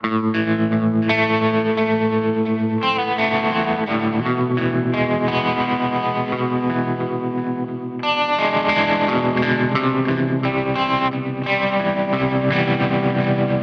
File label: Harley Benton DNAfx GiT DS Tremolo